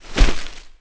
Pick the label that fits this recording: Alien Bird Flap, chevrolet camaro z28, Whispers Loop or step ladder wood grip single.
Alien Bird Flap